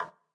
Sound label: hit Soft, roulette; advanced-roulette